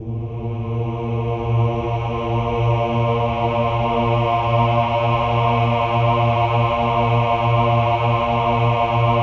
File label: OH-AH A#2, choir; Spectrasonics; Symphony of Voices